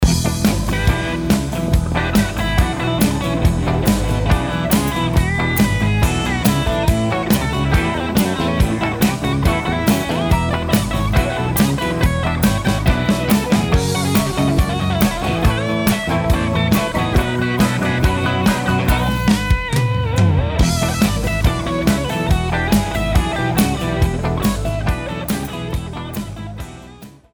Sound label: boogie country; Rock 4; Southern-fried country rock drum riffs; rocking, honky tonk; drums